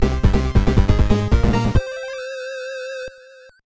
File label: Boss Clear; Esper Dream 2; nes